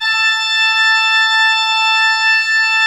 A4, Cathedral Organ; ILIO; Propeller Islands Cathedral Organ

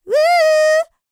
LICKS, Vocal R&B Dance; LT DANCE

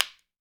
WOOD SPOONS; Jew's Harp & Spoons

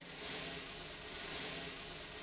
Br Sw L, Snare; Yamaha DTXpress-II

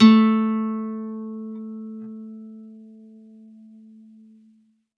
Campfire Nylon Guitar; Worra's Campfire Nylon

